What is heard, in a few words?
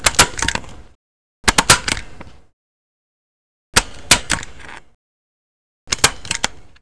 stapler